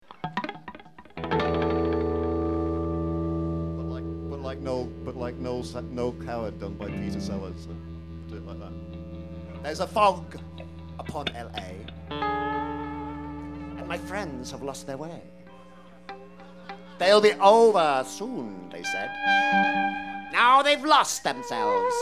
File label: singing drummer